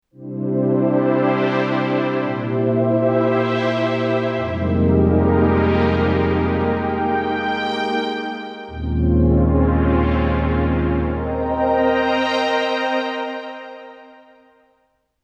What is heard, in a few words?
synth pad